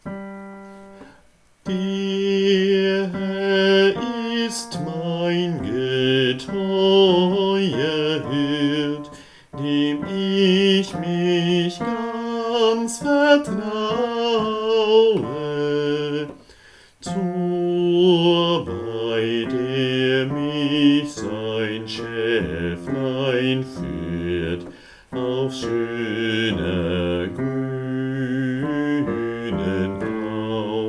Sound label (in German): Bass